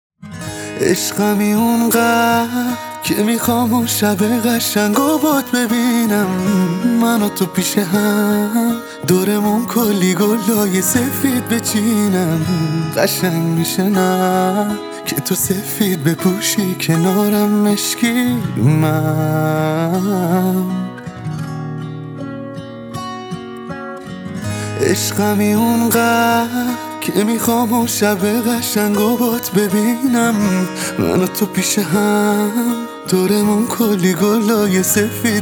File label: Guitar Version